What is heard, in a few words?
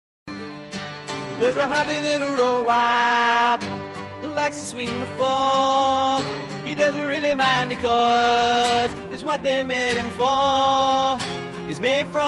Turbulent Robot